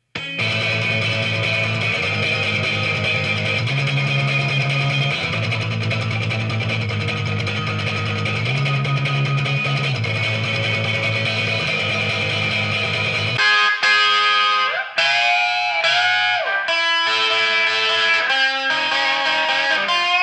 guitar

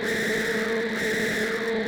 coco loop

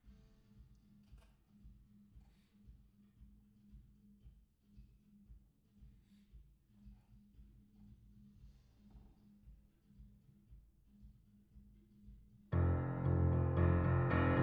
Piano

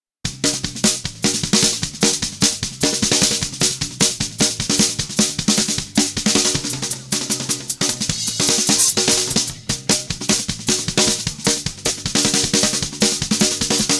drums

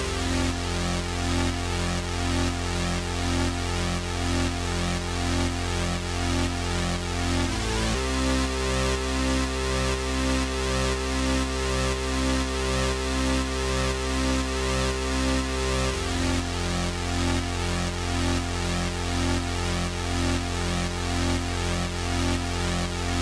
J60 Bass; Multitrack

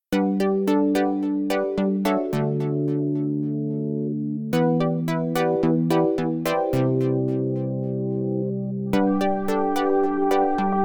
guitar

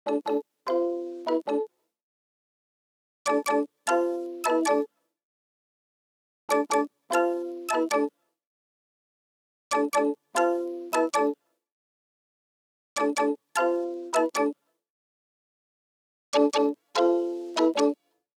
CMI Marimba

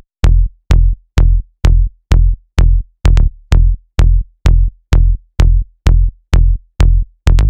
128 BPM Tonal Bass Loops - F; Melbourne Bounce; ULTIMATE MELBOURNE BOUNCE VOL. 1

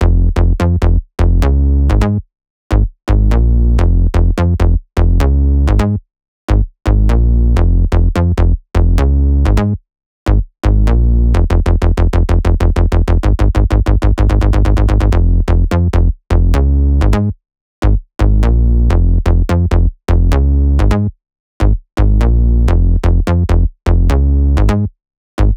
Bass

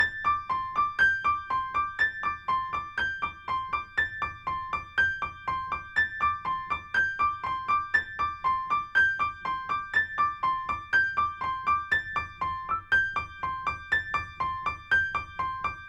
Piano

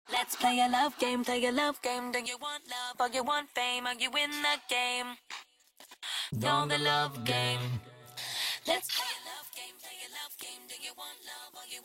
Vocals; Remix Sample Pack

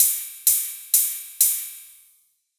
128BPM Spring Brake Kit, Future Big Room; Shockwave(PIL) - Future Big Room vol 1

